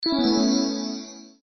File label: Wild Shine, slots; Holy Goat